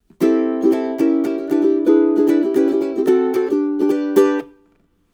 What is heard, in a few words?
Chords, ukulele